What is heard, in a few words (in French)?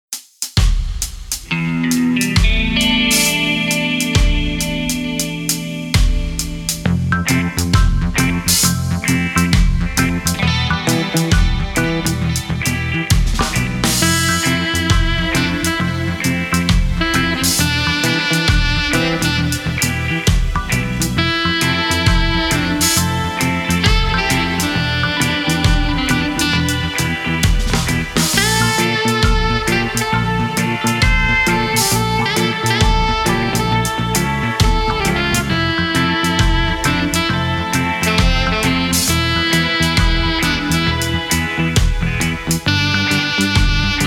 Rythme antérieur; axiel-son